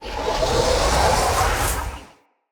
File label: Casting Device Charge Heavy; Weapons; Player